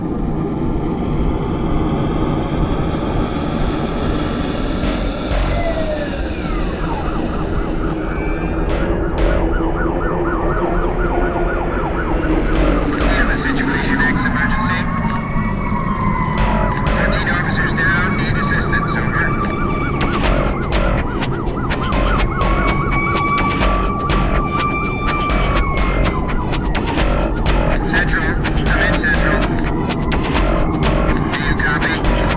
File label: BGM Alien SFX; Future Cop L.A.P.D.